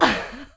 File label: Water dmg